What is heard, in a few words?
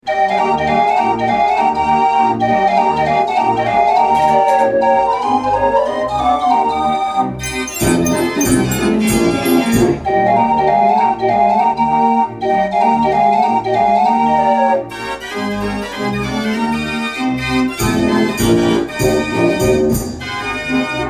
comedy music ringtone